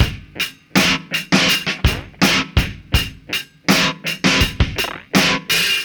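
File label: 82 bpm, beat loops; Zero-G; Monster Beats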